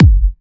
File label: Bassdrum